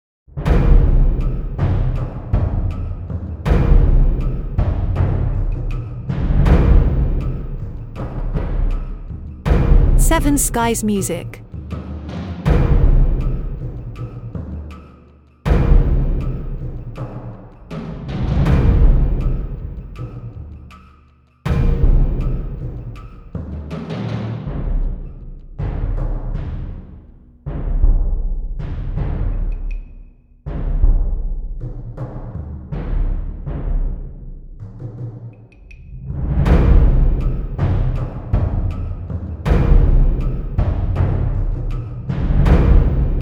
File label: Battle drums